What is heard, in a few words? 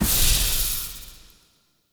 Heal; Powers; Banneret